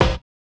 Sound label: collision; Spectrasonics; Metamorphosis